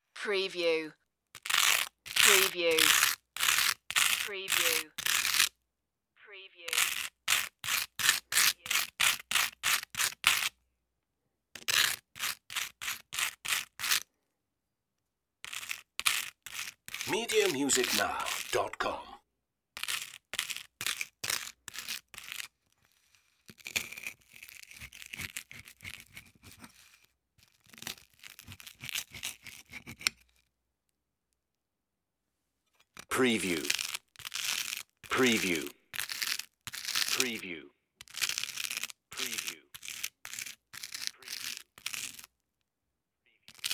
butter toast

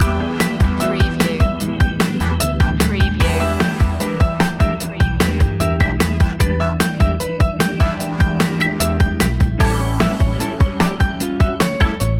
latin flavor

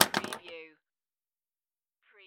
Pinball sound, ball launched

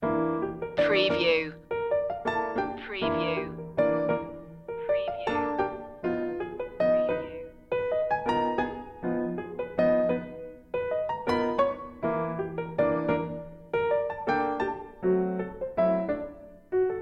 jazz up piano only

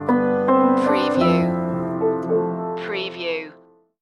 right here with you stinger

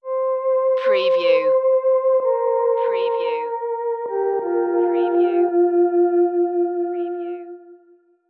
the black forest stinger

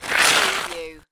Snow and Ice, Wet slush slide

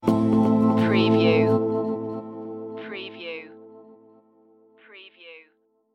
road to reflection stinger

